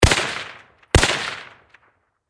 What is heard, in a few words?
Warfare Rifle M1 Carbine Semi Automatic Double Shot Close Perspective; SFX Bible 6